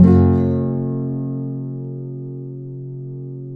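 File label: nylon guitar down major G, flam chords; Spectrasonics; Hans Zimmer Guitars VOL-2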